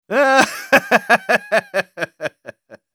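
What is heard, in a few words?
laugh happy, German; Medic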